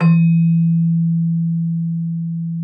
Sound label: Celesta E3; Xsample VOL-8